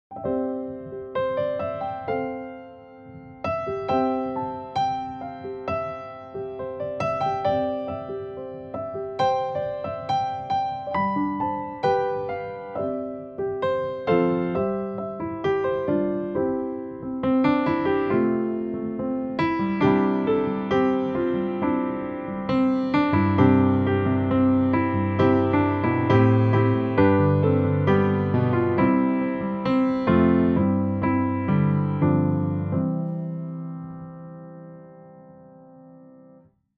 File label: Backing, playbacks; primeiros passos